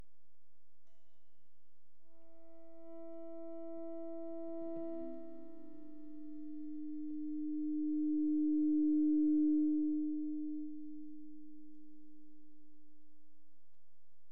Feedback

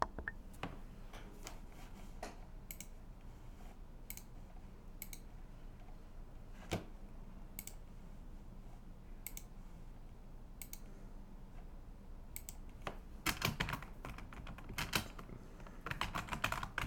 Office

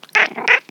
Meow

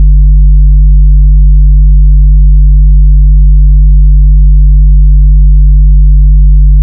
Bass Tone

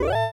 Retro Accomplished

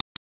Mouse Clicking Sound

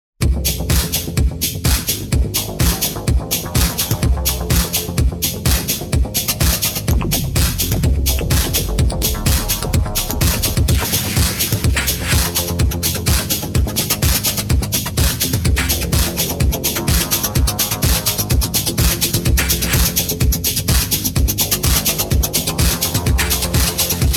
Techno; Blacklizard77

